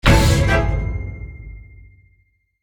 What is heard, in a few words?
Tense (Stinger)